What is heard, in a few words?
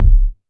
DM5 Kick, Alesis DM5 drum machine